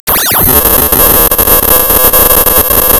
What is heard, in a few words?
pop, tearing paper